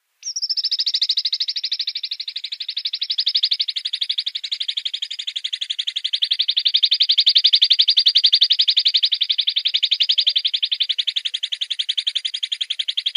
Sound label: Arabian Babbler calls